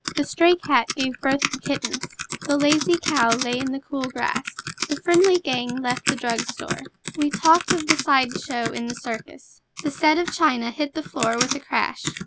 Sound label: keyboard